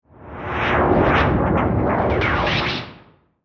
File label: Noise Stingers